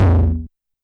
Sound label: Tom Ana Dist, YAMAHA RM1X